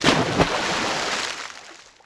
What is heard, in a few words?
splash; Splashdown; XBOX